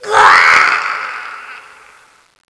boosterzombie death; zmall

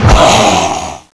pain; zmall

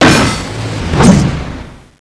attack, scorpion

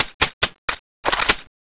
fly; thanatos3

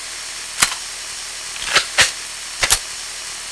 reload, weapons; cso; thanatos3

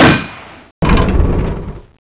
guard start, scorpion

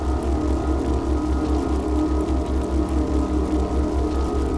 turret idle pull pin fire, weapons; zmall

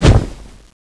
ghost attack; zmall; ZM-AMXX

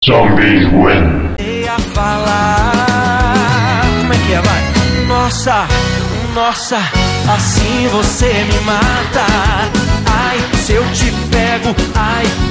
zombie

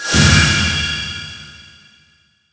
m3dragonex charge; zmall; zm boost; privilege; mboss

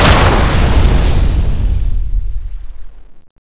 fire grenade exp; zmall

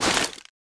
turret pull pin, weapons; zmall

